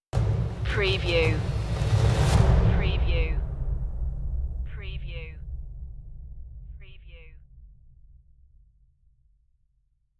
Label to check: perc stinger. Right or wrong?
Right